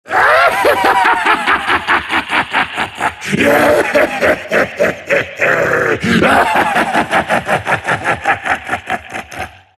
silt laugh; dota; siltbreaker

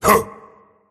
pain; tiny

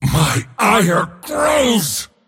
cast pain; dota; mars

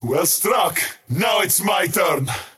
skywrath crown attack pain; skywrath mage

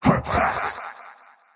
attack pain; dota; faceless void

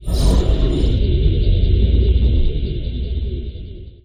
pick, projection; ui; dark seer; dota

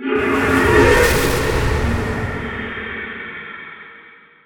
fear wisp; weapons; dota; dark willow

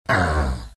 pain, vo; arc warden

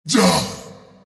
arc pain; Zuus

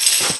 chains, movement; lifestealer

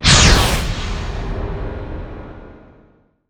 ice age target; weapons; dota; lich

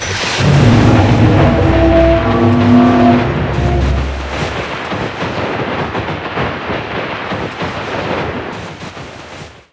dead water drag; tidehunter

